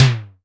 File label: SIMMONS SD-5 drums